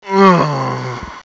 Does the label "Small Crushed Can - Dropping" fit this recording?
No